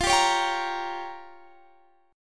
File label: C sharp 6th Flat 5th F